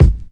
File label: kick creamy solid vinyl; Kicks; single hits